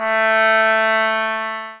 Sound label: sax